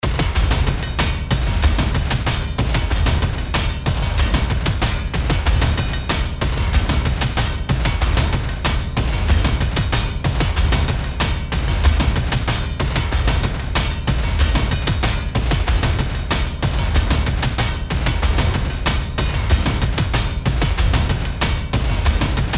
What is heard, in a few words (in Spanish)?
golpes repetidos